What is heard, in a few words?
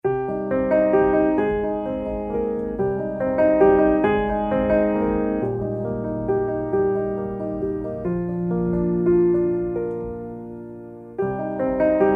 Memories